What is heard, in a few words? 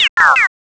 ufo